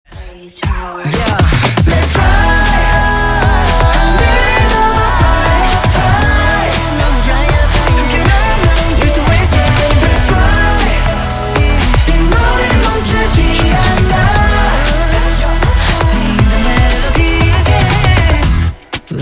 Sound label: korean version